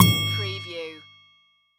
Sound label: Multimedia Percussion